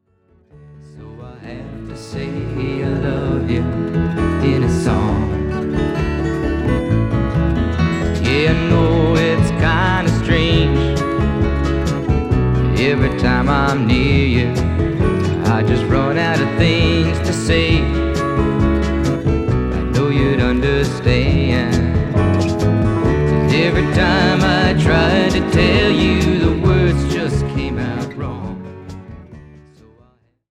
folk

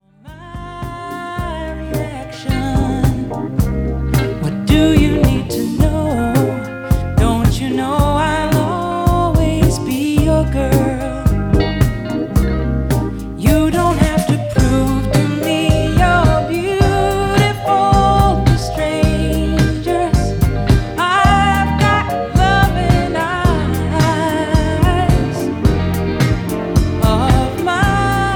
pops male laser

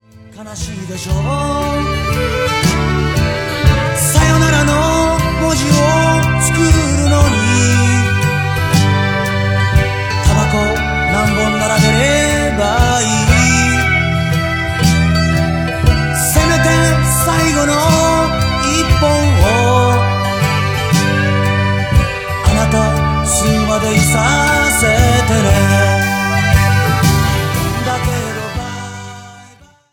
radio folk

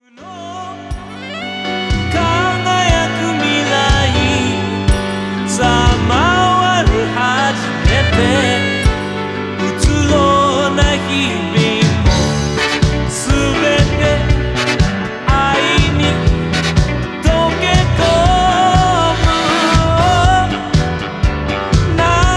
male vocal; 邦楽・男性ボーカル; 男性ボーカル02

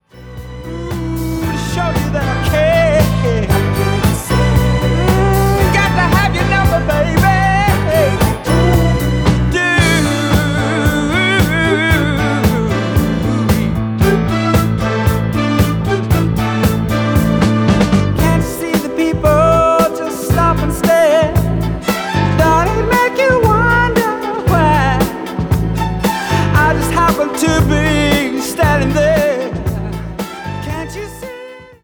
pops men

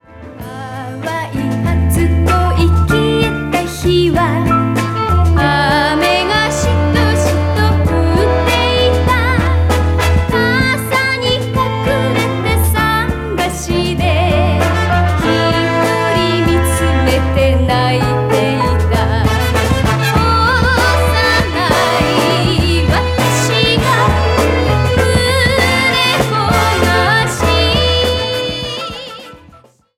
idol

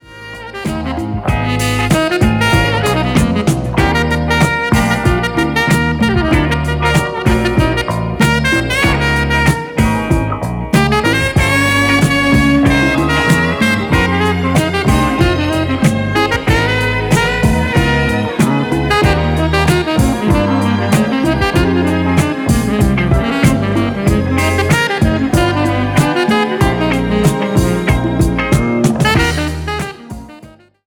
Fusion sax

